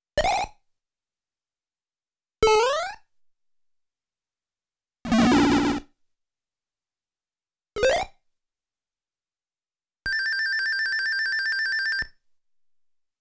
Raccoon Swinging ~ Tail Jump ~ Put on a Different Suit; super mario world live; Turned into Raccoon Mario!